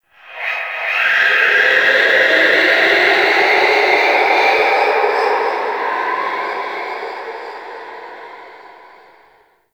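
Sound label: Shipyards Distant Cry; Warframe; Grineer Shipyards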